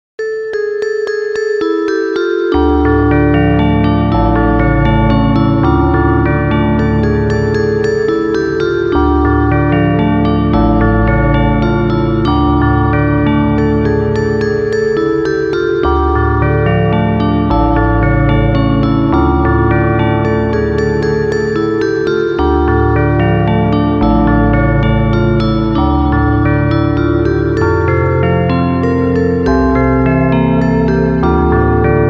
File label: soundtracks; Fur Elise